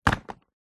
household vacuum cleaner set down on tiled floor while off